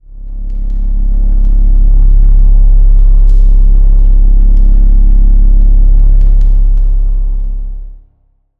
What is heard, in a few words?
calm, Supermatter